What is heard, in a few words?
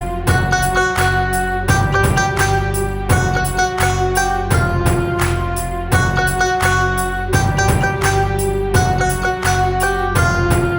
Instrumental BGM Cover; Tanhaji The Unsung Warrior